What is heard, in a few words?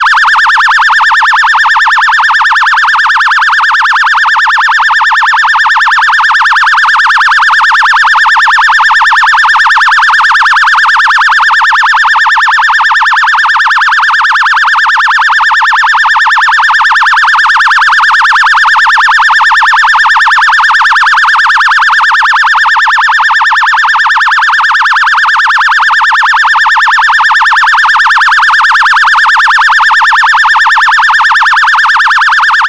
electronic phaser siren